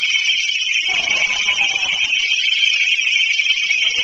Unknown scream; St Joseph Cemetery; Spirit Chasers of the Unknown; EVPS

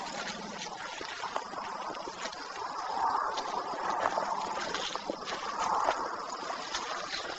Unidentified sound; Goodrich Cemetery

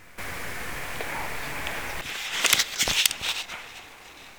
recorder left down stairs; Old 6th Detroit Precinct

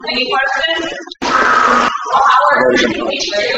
screams someone talking, EVP; Waverly Hills Saniterium EVPS; Spirit Chasers of the Unknown; Lower Michigan Paranormal Society

